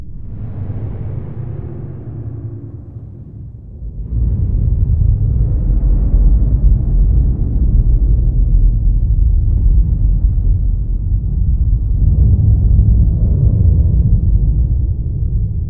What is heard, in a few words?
Shaft Amb Loop; moul